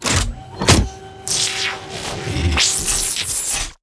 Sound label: Heavy Tank Railgun; blue archive